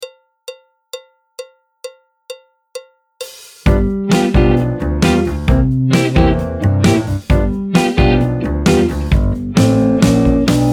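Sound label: Blues backing track, Jazz; trombone; Jazz Syllabus Level 4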